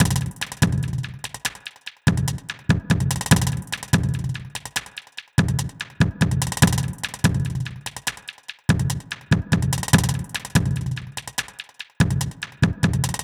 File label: industrial beat, 145BPM